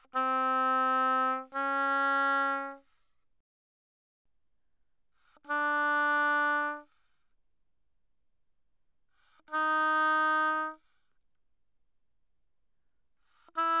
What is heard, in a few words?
oboe mf c4 b4; smallbox